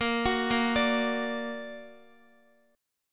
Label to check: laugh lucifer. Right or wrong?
Wrong